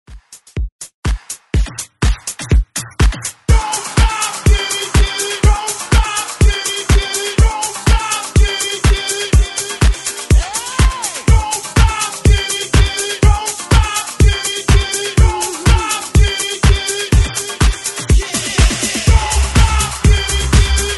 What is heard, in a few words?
90s Dance Hype 123 Bpm; DJDOLLS